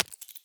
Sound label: step, headcrab black movement